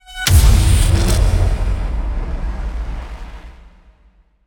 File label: End Stinger; Void Bastards